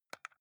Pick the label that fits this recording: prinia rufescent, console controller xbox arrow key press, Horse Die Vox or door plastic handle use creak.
console controller xbox arrow key press